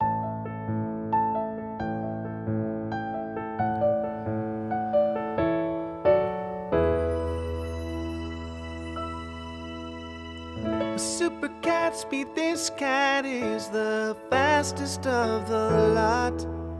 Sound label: Song Act with vocals